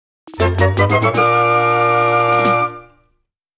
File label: Get Important Item Fanfare; legend of zelda the link's awakening